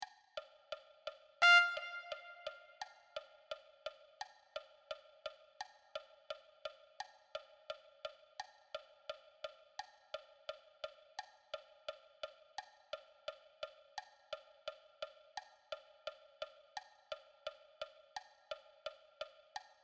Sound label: Trumpet